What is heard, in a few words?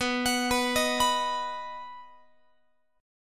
B Major B down Guitar 4A 4E 5A 5D 5G sharp 6C sharp; arpeggiated